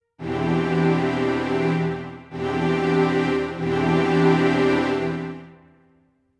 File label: strings